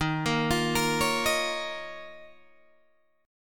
D sharp 7th Suspended 2nd D sharp, Guitar, DADGAD

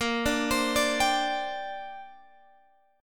Bb 6th Add 9th Bb Guitar Open G

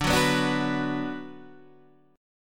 D 6th D down Guitar Half Step Down, chord

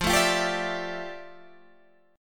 F Major 13th, chord; strummed

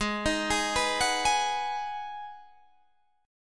G sharp Minor 7th, Guitar Half Step Down

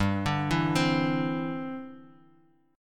E Minor 7th Sharp 5th G

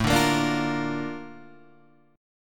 Bb Major A, chord; Bb/A strummed; JGuitar

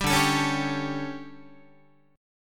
D Major 7th Suspended 4th, Guitar

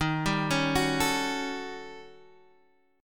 Eb 7th Sharp 9th Flat 5th, Guitar Open D; 13,10,11,12,12,x; down

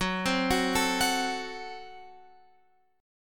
Gb Diminished, down, Guitar, Open F

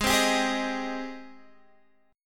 G sharp Major 7th, Guitar, Standard; strummed; chord; x,11,10,12,9,11

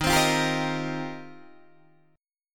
Eb 6th Add 9th, Guitar, Open D

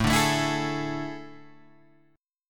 A Major 7th Suspended 4th, Guitar, Open G

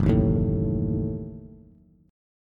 Gb-Minor 6th, Bass; strummed; JGuitar

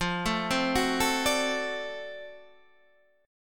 D 7th F, guitar; D7/F arpeggiated; JGuitar

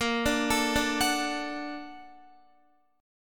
Bb Augmented Major 7th Bb, Guitar; Bb+M7 arpeggiated; JGuitar

